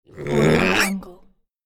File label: piglet grunt